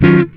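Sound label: guitar chord funk; Univers Sons; Jazzistic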